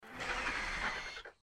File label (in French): Ptera Agressif, Ptéranodon; Jurassic Isle RP